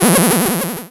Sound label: Cry